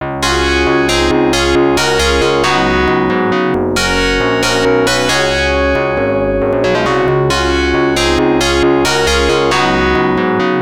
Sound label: high score